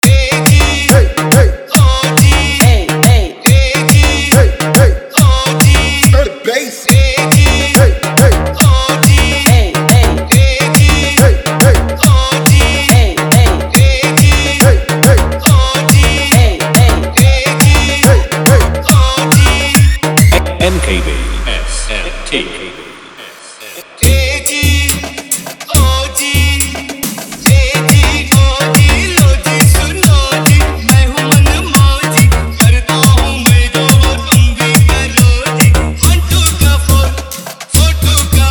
Hindi Remix Song

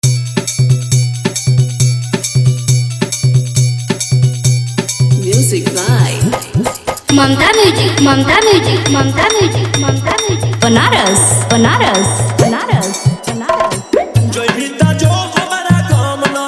New Bhojpuri Viral Dj Remix Song

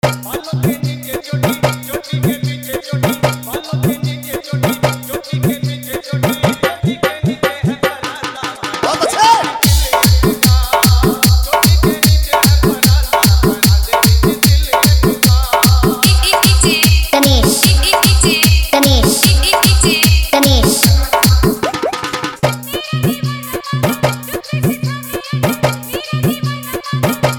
Old Khatarnaak Hindi Dj Remix Song

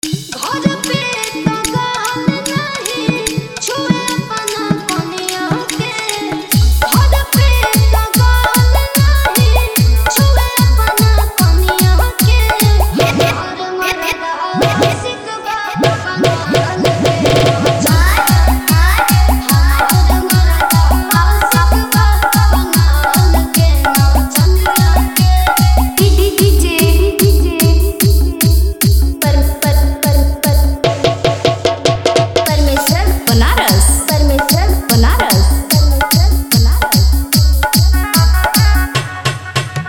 Bhojpuri Dj Song Hard JBL Bass Mix

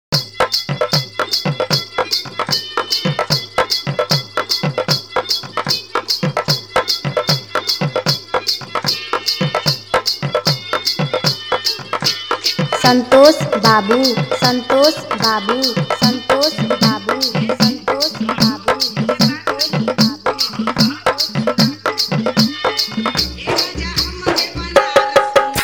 Vibration Bass Mix

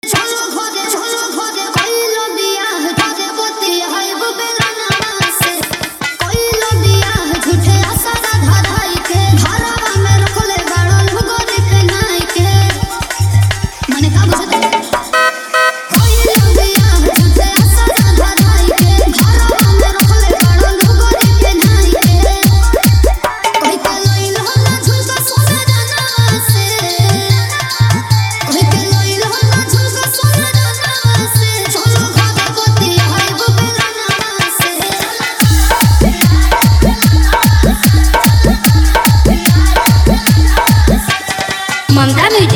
New Bhojpuri Dj Remix Song

